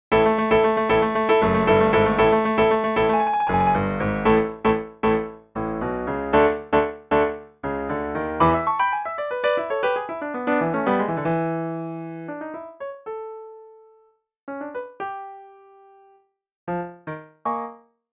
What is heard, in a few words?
Rehearsel Piano